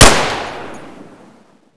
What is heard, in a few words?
tec-9, weapon